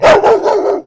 Dog Bark Quad Bark; DogBarks 1.0

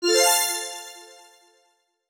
Brightest Star; HintsStarsLite

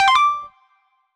Score Point; HintsStarsLite

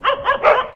Dog Bark Triple Bark; DogBarks 1.0

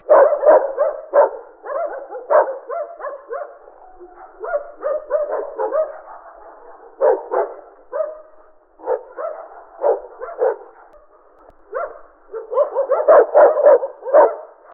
Pack Of Various Dogs Barking; DogBarks 1.0

